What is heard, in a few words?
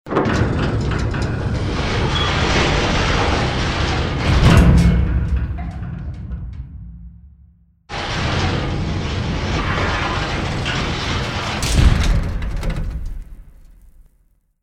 Desert Tower Grate Slide Open; Journey